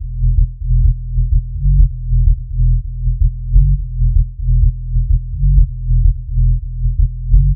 Bassline 127 E

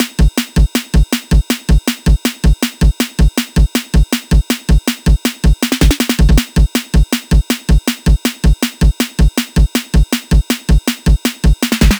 160 drum loop unusual off beat kick

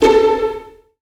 Gritty String Pluck A1

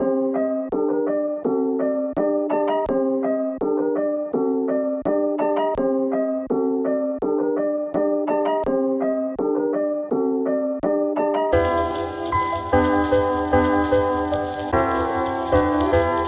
Trap; Melodic Kings

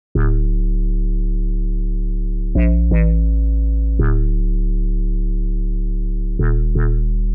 House Bass Loops D 125; Gangster

